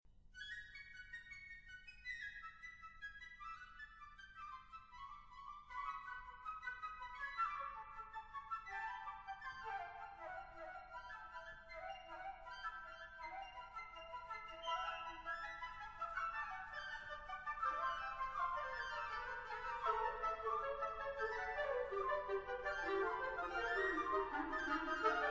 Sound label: Fugue; Philharmonic; Britten; Variations